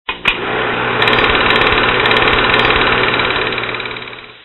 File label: movie projector